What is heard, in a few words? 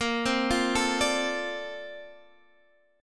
D-Minor 7th Bb, chord